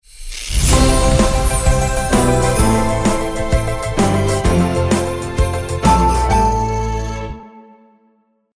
Sweeper

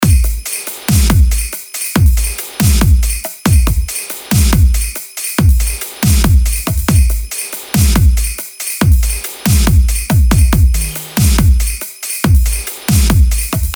Kick Beats; pack noel

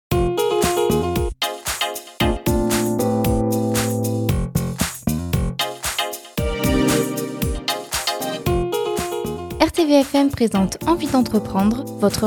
Intro jingle; Esperluette; Envie d'entreprendre

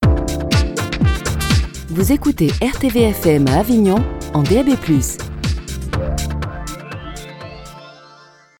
Jingle

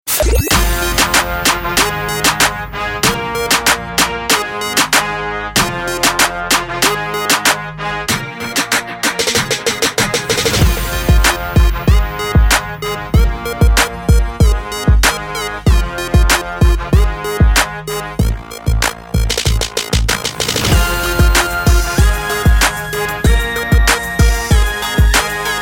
Bed Hip-Hop; PACK BVO BEDS

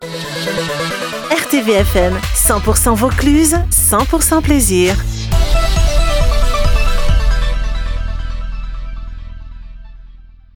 Jingles; 100% Vaucluse

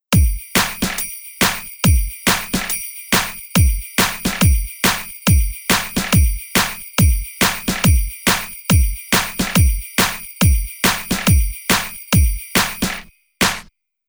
Kick Beats; pack noel

